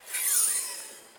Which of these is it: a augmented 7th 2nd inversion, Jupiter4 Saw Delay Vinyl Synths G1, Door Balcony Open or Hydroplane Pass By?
Door Balcony Open